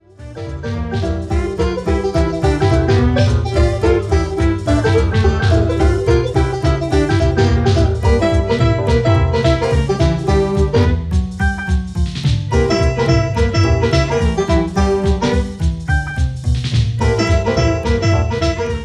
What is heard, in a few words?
banjo, bluegrass